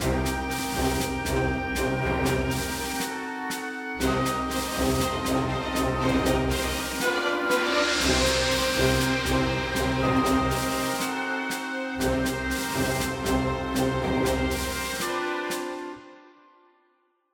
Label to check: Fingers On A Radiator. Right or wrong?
Wrong